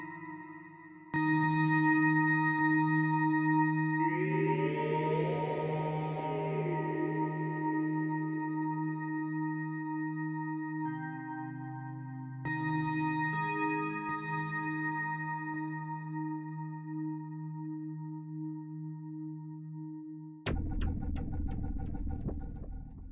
Organ

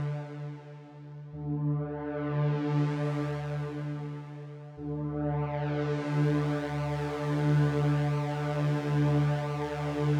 Rave Party pad

